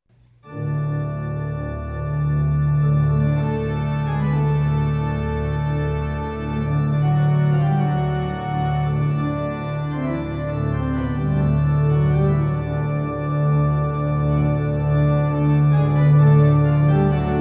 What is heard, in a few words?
organ intermezzo